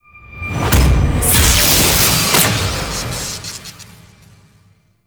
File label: Anger; Cinematics; Intro Throne Room; Ballas